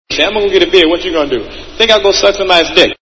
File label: beer blow; svencoop